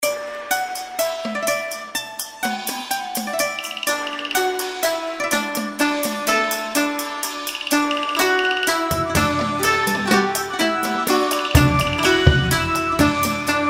intro bgm; Imperial Girls

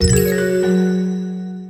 stop bonus; Grand Fruits

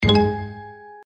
bonus; Stocked Bar

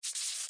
hit thunder; Thunder Fighter

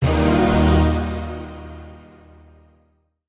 freespin init; Book Of Lords

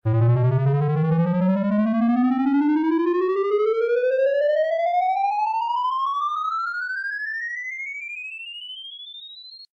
COLLECT GO; Helena

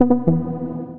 count up end; Grand Fruits

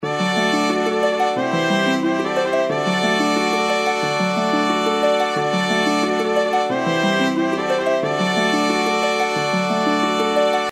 intro bgm; Royal Demeanor

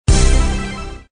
roulette win; American Poker The Legends II

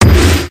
bonus chance; Lucky Coin

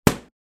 bubble pop; Bubble Double

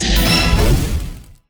expand wild; Fire Queen

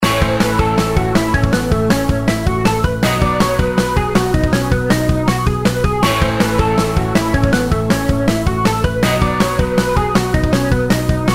intro bgm; Muscle Cars

